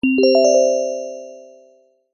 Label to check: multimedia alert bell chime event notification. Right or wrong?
Right